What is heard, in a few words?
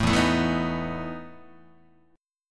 E Major 7th Suspended 4th E down Guitar; strummed; JGuitar